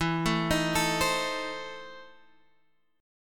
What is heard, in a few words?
E Major 7th Suspended 4th Sharp 5th E down Guitar Drop D